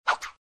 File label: ui click; disneyxd; stuntastic